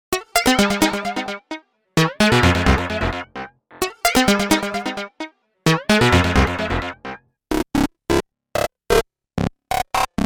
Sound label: Loops